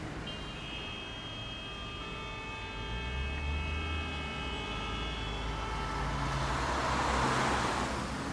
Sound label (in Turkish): şehir içi yoğun trafik ve korna sesleri